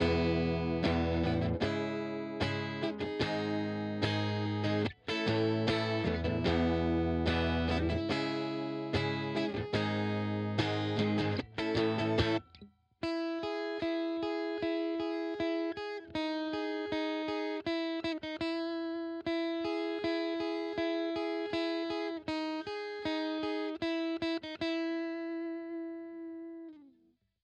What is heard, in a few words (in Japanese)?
エレアコ アコギらしく; Brit and Clean